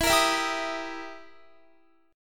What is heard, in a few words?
E Minor Major 7th Flat 5th E down Guitar; EmM7b5; strummed